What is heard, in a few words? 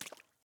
water step